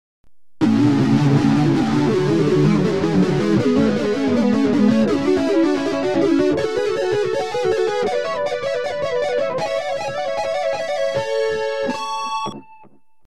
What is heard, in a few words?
Hammer tap